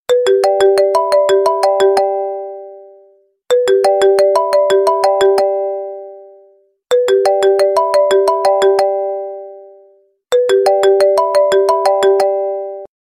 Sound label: Apple New Ring